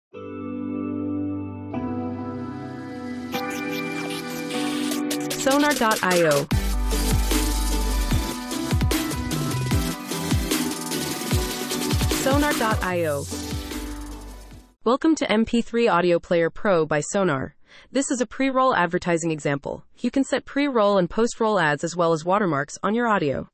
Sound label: Surfing Vlog Intro Logo